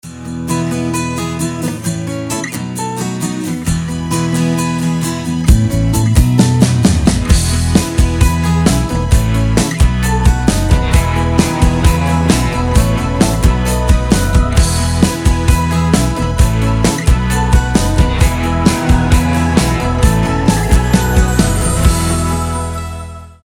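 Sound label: New Year acoustic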